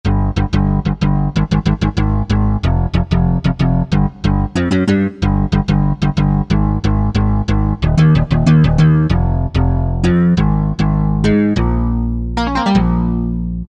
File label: Synth Bass, Korg M1; Factory preset examples